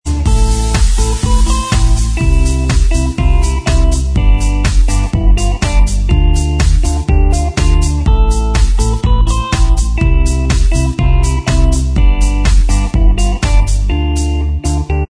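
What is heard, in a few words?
instrumental, backing track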